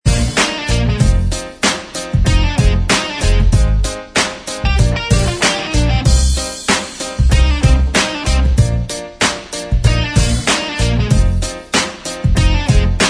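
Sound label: Instrumental Track, backing tracks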